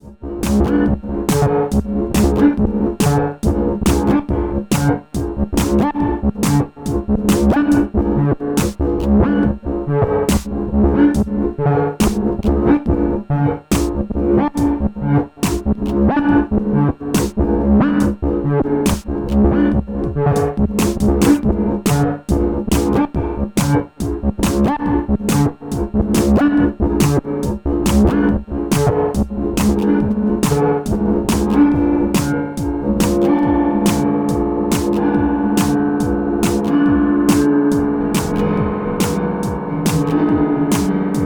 Korg volca modular With Beat

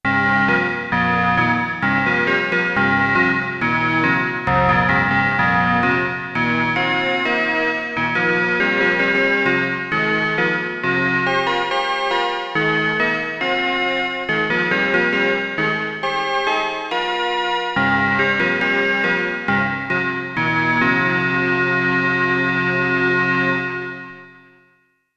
Perc Pad

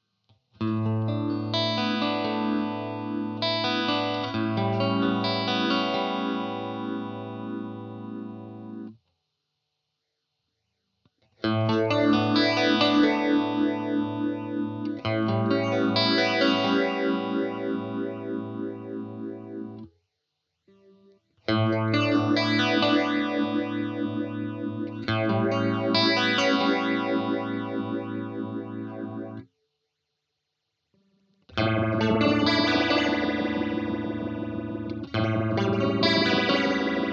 Indie Phaser riff; VALETON Dapper

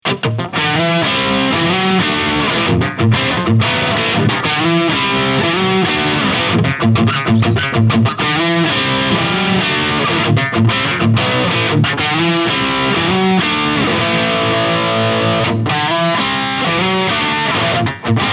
Lead; ESP LTD KH-V Metallic Gold

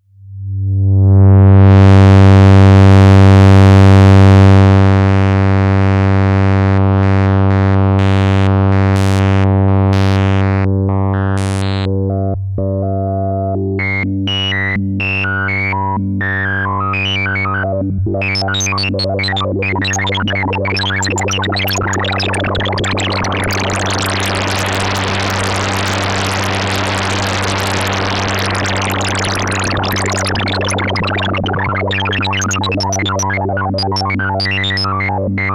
Sample Hold Cutoff; Grandmother

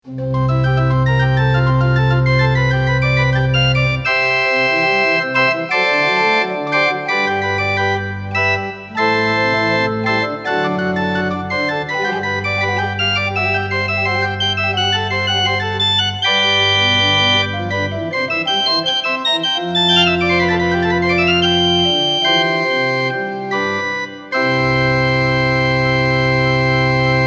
Hammond XK 4 Principal Chorus

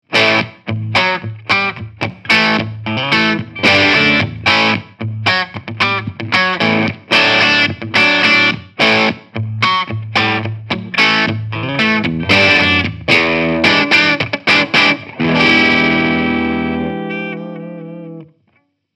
Mesa Boogie Fillmore 50 Combo Crunch

